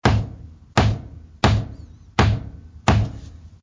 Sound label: hammer on wood